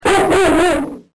dog bark

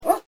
dog

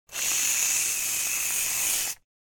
hair mousse

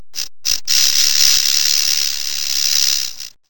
rattlesnake

